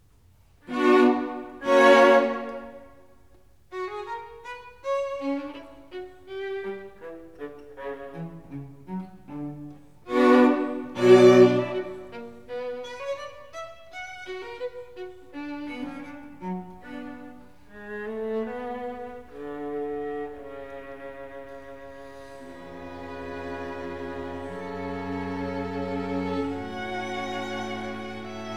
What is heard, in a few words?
String Quartet in C Sharp Minor, Allegro; Beethoven String Quartet 14